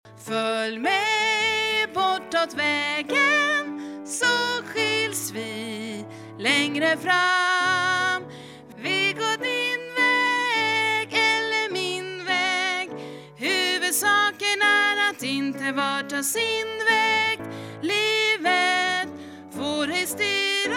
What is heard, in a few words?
tenor